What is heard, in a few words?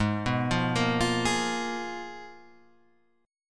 G sharp Minor 6th Add 9th